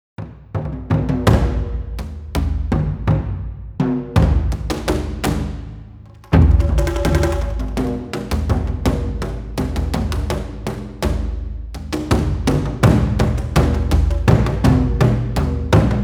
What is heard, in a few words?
taiko; taikosaurus